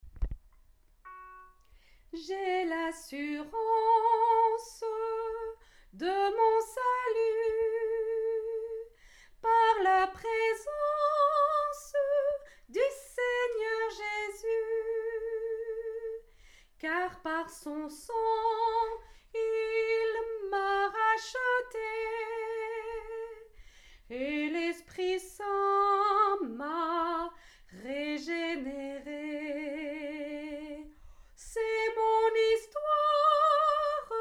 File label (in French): SOPRANO, chant a plusieurs voix; atelier de chant a plusieurs voix